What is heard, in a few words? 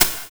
Drag HiHat; Sonic Foundry (Sony Creative Software); Drums & Drones; Construction Kits